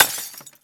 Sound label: mlay break, weapons